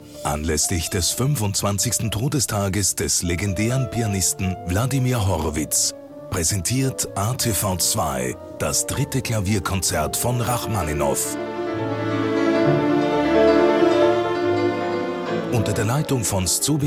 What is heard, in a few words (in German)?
Klavierkonzert; Rachmaninov